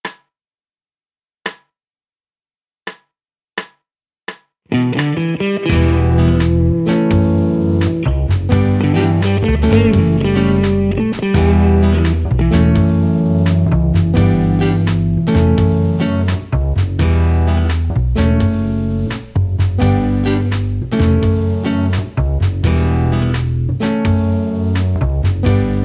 Jazz Blues in Em 85 bpm

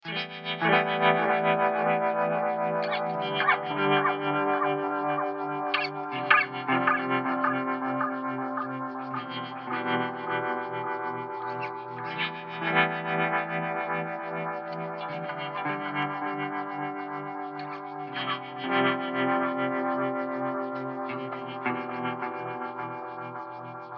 Am 160 GTR CHORDS

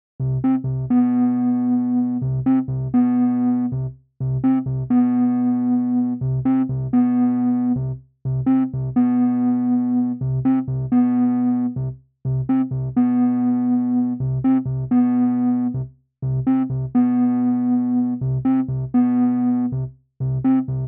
F 120 Impro bass riff

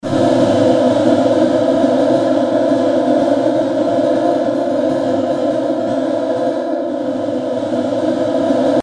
Animated strings

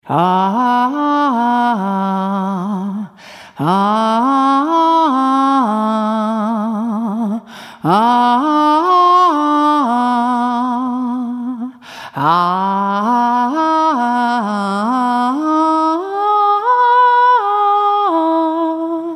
Aahh vocal sample

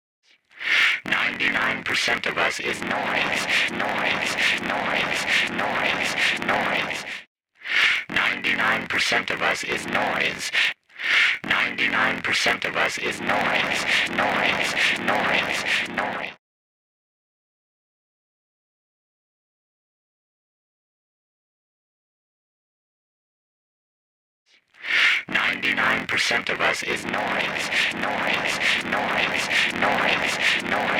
ninety nine percent noise

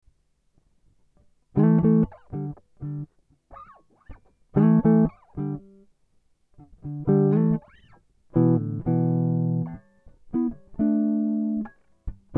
Am60 GTR impro

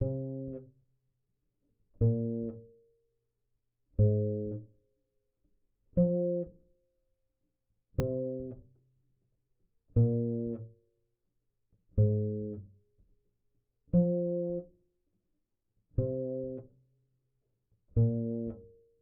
falling bass verse acoustic 4 times; verse 1

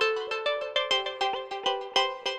synth Liquid Keys 100bpm G

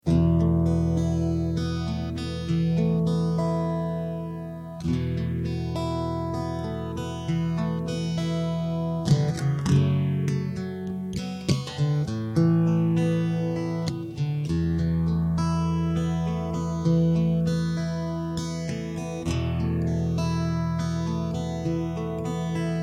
E 100 Acoustic GTR arp

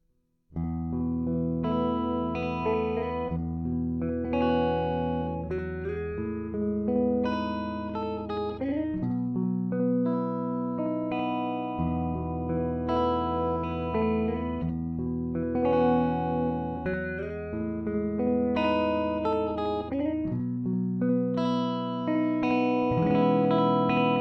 guitar motif in E major

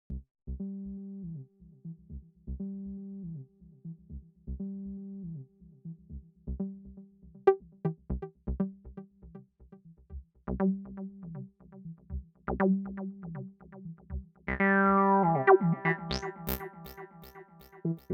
Electronic

